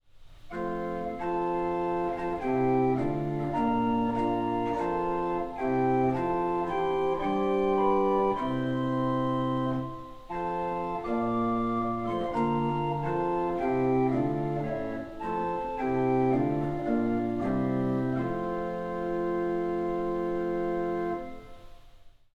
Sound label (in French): 2eme clavier Gemshorn 8 et Rohrflote 4